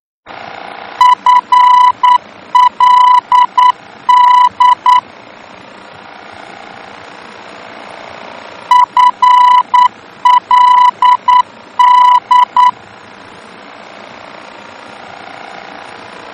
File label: VOR, aerobeacons; germany